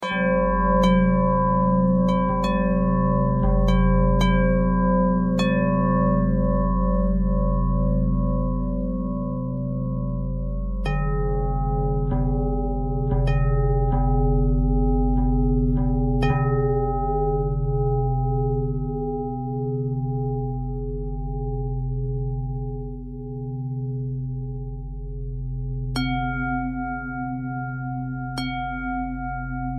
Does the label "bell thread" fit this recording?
Yes